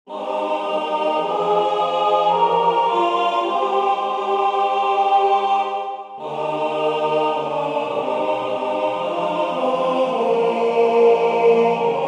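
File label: alto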